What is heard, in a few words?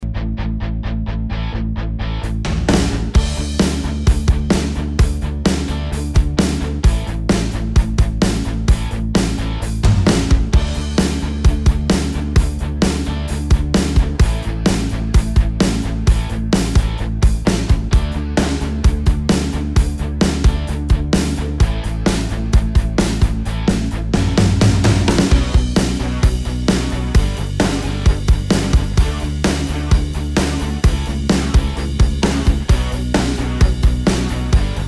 power super kit